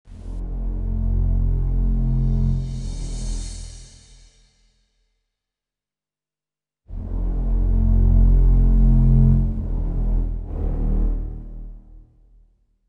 Background Music

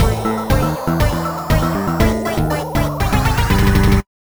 Speed Up!; Diamond Software Shuffle

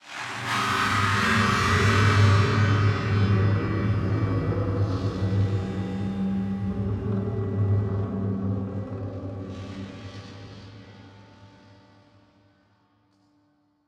Stinger Intensity; The Park

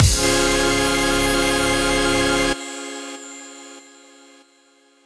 Retry Jingle; Broken Night Dimension; Windows

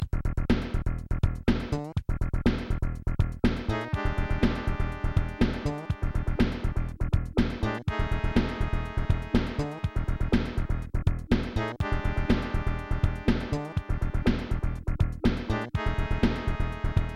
Volcano; super mario maker 2; Super World

